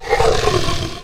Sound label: chicken die